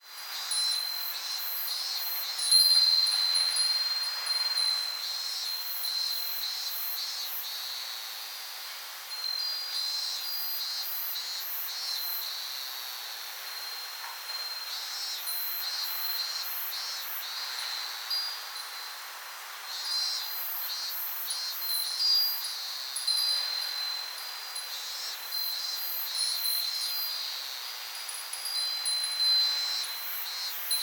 furin house

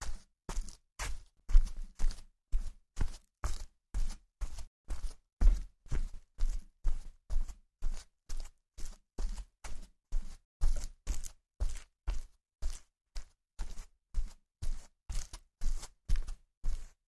walk tatami

